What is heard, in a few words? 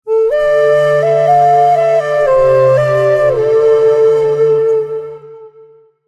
phonetones, Sony Ericsson; Celtic Hills; PlusFourSix; Sania (T650)